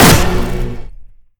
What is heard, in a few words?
condor shoot; npc; svencoop; morass beta